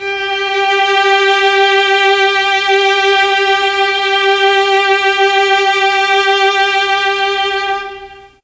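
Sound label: G2 violins, mellotron; CHMB 3 VLNS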